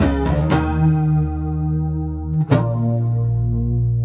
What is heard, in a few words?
Bass Amb Chords Eb, Bb 118